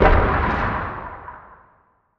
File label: asteroid damage interstellar big; Factorio